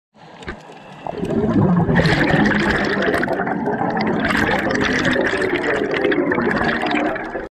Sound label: Diving mask with capsule; ماسک غواصی با کپسول